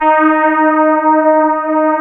CMI ORGAN; Wizoo - Powered DX

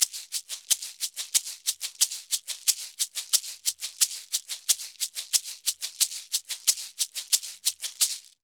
Maracas, Festa Latina, Drums & Percussion; Best Service; Festa Latina VOL-1

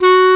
clarinet F#3; InVision Interactive; Lightware VOL-1; Instruments & Percussions

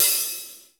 ROOM OH, ROOM KITS; Crypton; DrumBase5000

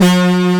AN SYN, SYN & LEAD; SAMPLE WAVE MIXING 2

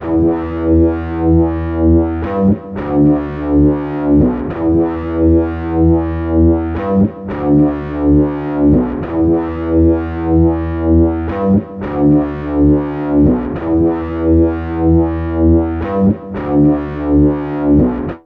Bass Guitar Loops

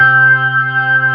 org C3, Organ B3 Hammond

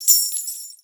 BELLS, percussion; Best Service ProSamples vol.42

